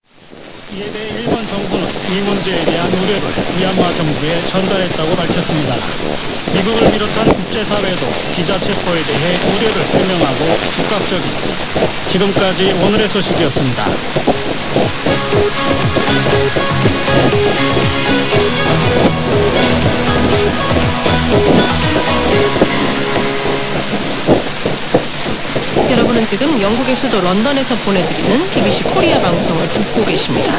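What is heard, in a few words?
BBC World Service, Choibalsan, 1431; sdr Amberg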